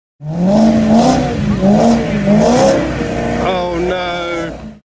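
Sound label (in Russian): машина поднимается на небольшую горку по мокрой грунтовой дороге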